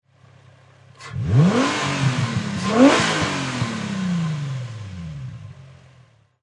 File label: Infinity G35 Engine Double Rev, High Performance Car; Auto, Power Car; Infinity G35. Engine perspective. Double Rev - revving twice; Race Car